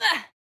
Overhead Swing; Jackie

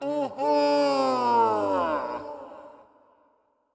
Toxic Mask Big Laugh

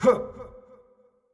Effort Tiny; Marv

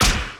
Ball Hit

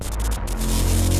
Laser Start; Avie

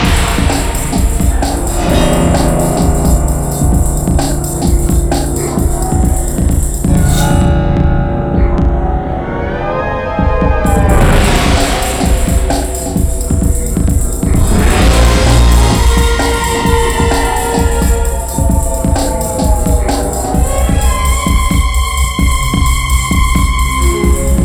Fight loop; Ben 10 Protector of Earth; Wii

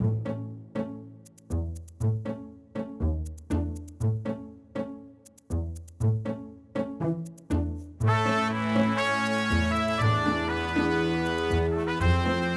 Musicloop; Pokémon Masters Arena

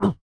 Hurt; Joblin

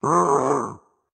Leap; Caffiend

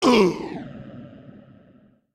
Hurt

